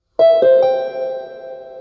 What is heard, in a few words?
Friend chime